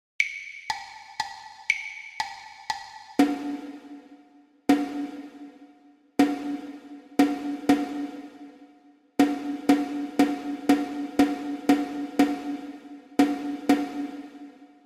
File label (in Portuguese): percepcao ditado ritmico